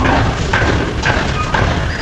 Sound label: amb